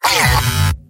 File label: pain sharp; Heavy